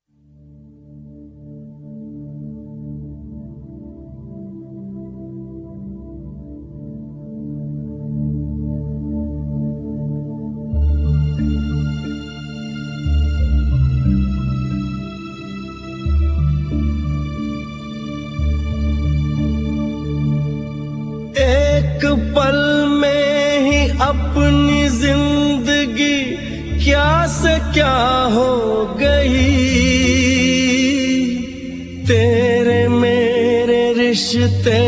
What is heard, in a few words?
Male - Sad; Rishtey (2002)